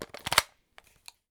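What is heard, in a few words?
mp5 weapon mag in; Escape from Tarkov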